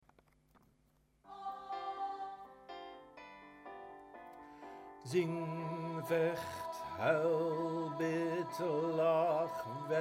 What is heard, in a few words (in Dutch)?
Refrein Zing sopraan